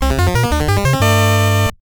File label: Level Complete; Dynowarz; NES